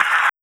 Paper Hit; Sonic Foundry (Sony Creative Software); Esoterik Beatz; Paper Fx

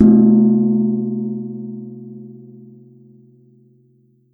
java, MIXED GONGS; WORLD INSTR 2; WORLD PERCUSSION

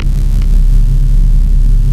SAW FUNNELS, TECHNO; Pro-Rec - Synthsonic; Z1 TECHNO

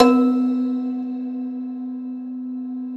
Rubbery Piano, prepared piano; Total Piano

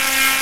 scifi; E-MU Formula 4000 Series Vol. 3; Analog Odyssey; VK+ Instruments

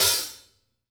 OPEN, HI-HATS; Sampleheads; New York City Drumworks VOL-1; SP HI-HATS

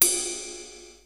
RIDE, Boss DR-550; Drums

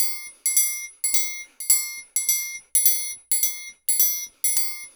percussion loop

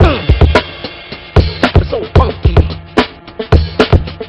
funky, breaks breakcore

